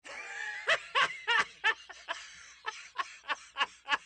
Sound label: laughing sound guy